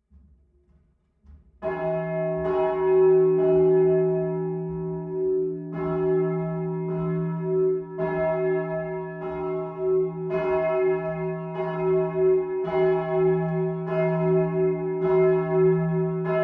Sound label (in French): bellerive int; campanologie; stpierre